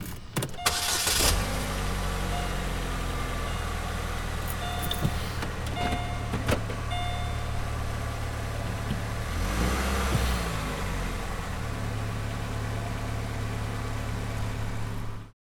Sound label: Car Start Vehicles Land